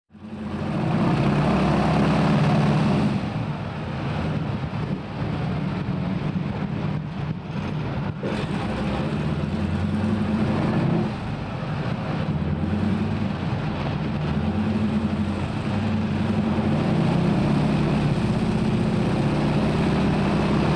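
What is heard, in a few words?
sound of airplane from inside; Nano Audio FX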